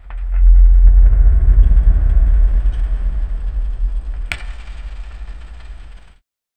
massive stone gate openin

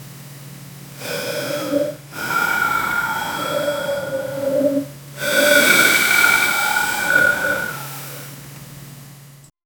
sound of heavy rag, scratching; sound effect

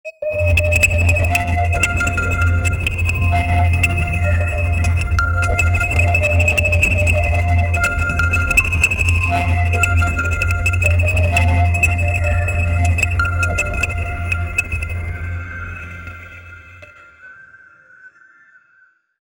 trippy acid musical ambie

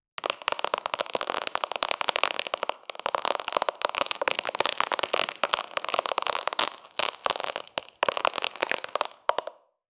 close up of empty plastic, footstep

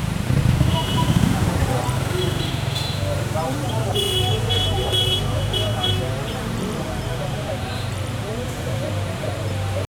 indian tea shop ambience

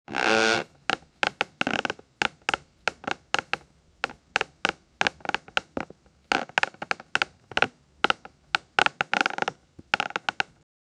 an animal made of rock, stone

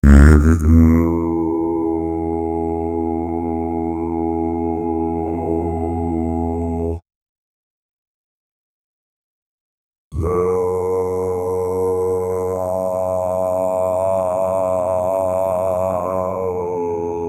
africa trible voice old, 140 bpm

